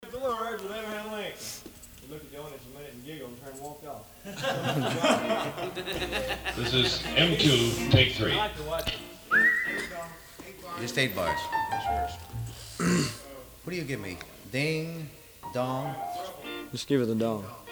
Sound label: Whistling Song; radio recorders tapes